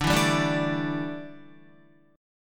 D Suspended 2nd Suspended 4th D down Guitar; strummed

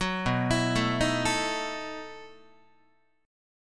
B 11th Guitar; arpeggiated; 9,7,7,7,7,8

